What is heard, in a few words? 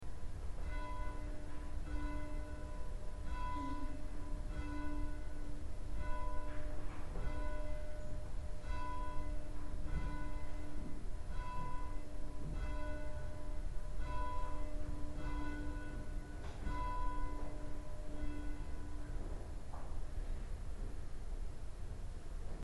bell